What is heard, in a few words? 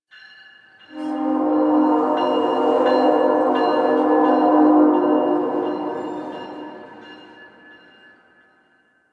Distant Train B; Warframe; Ambience; NineteenNinetyNine